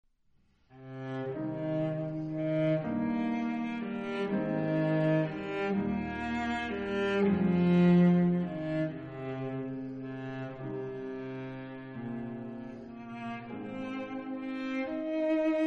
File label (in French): improvisations cello piano; Deux